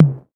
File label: mid TR 606 synth tom D key, tr 606 synth tom tuned to the D note